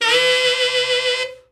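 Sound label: TENOR overblow, Sax, FALL; Giga Samples Collection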